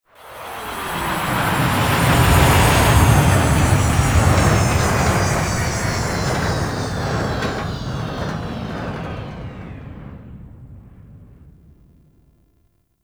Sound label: Grineer Ship Liset Extraction Arrival; Vehicles; Warframe; Liset Flight